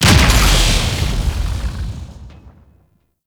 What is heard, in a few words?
Sentient Vessel Step, Enemies; Warframe; Tau; Movement